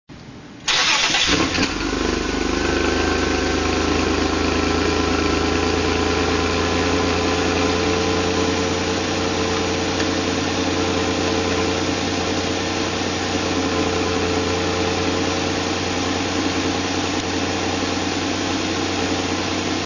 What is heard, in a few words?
cold start, ypipe